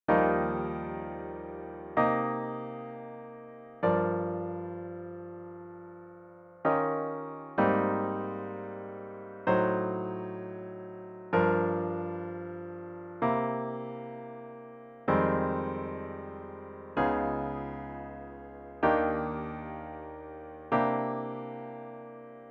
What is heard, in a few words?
solo piano